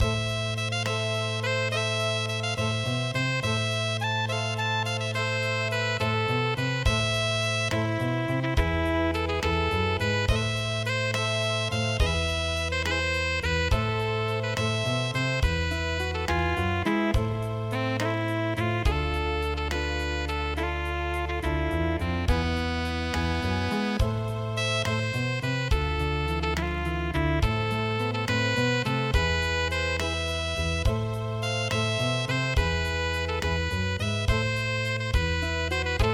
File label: ginza enka